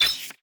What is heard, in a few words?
ui special popup